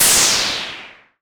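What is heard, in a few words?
cymbal, Moog Minimoog Drums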